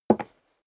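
step leather shoes wood creak single left, footsteps; schritte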